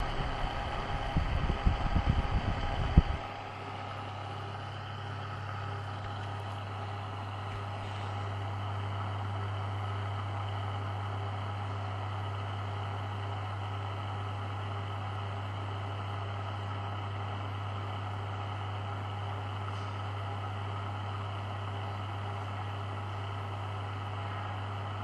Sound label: projector running